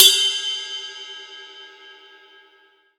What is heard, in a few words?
RIDE